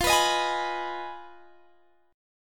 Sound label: F Minor 7th Flat 5th, Guitar, Standard; strummed; JGuitar